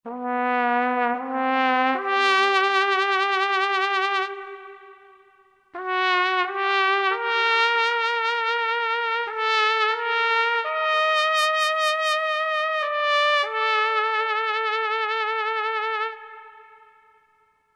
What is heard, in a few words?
Sad Trumpet; World; Latin; Roland SR-JV80-18 World Collection Latin